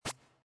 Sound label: scratch button